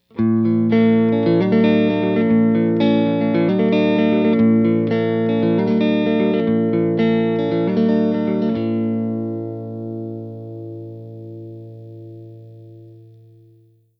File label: '50s Tele Neck Rhythm